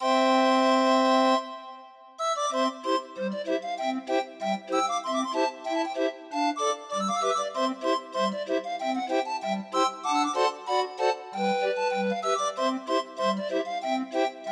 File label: Dashing White Sergeant, Grind Organ